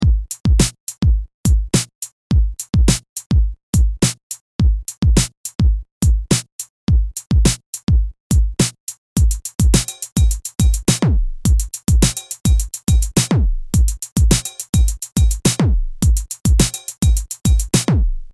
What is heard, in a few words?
beat, drum machine